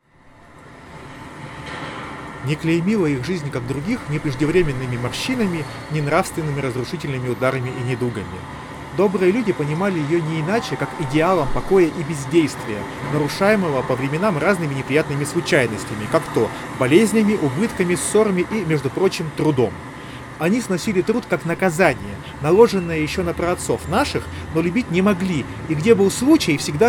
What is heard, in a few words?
Recorder mic ext noise; noble audio fokus apollo pro